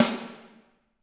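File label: snare drum, Drums; Bob Clearmountain Drums I; SD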